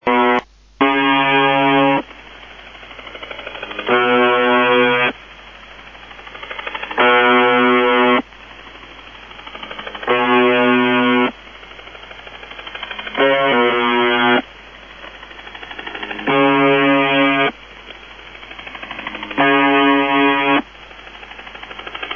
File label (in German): Buzzer schwankt